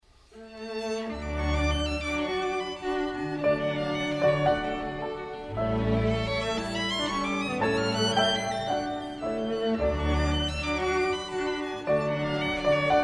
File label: piano quintet a major; schubert